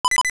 Low Health; Low health beep (repeats every 1.5 seconds)

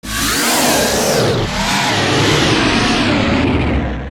Ganon Stun

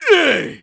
Grunt; Gonzo grunting at the ship's wheel

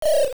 Owl Hoot; The Owl hooting (single and double hoots)

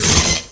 Mirror Shield Contact; Mirror Shield coming into contact with light

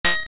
Shield Deflect; Link's shield deflecting a hit (also used when Kinstones collide)

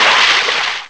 Splash; splashing in water; Tingle splashing in water; zelda

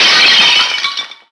Large Pot Shatter

